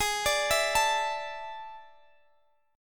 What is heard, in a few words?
D Suspended 2nd Ab; Dsus2/Ab; arpeggiated